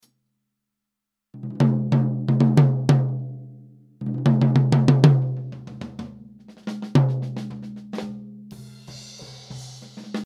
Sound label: tom